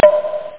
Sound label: chunk; beach